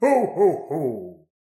ho ho ho

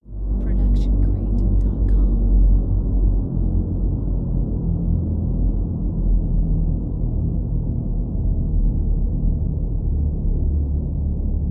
intense string fall

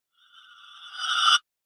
Reverse Magic Shimmer; SoundsCrate

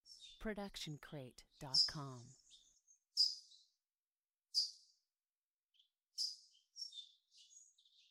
Multiple Birds Chirping; SoundsCrate

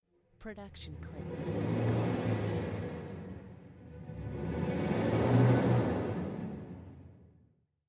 timpani orch gliss

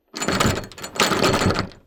struggling to open door; soundscrate

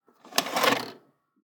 VHS takeout; SoundsCrate

